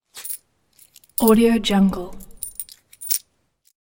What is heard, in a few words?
coins shuffling